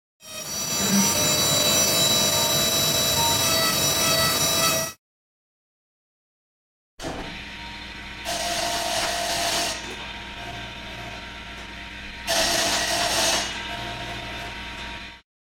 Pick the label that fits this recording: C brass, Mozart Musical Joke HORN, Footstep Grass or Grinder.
Grinder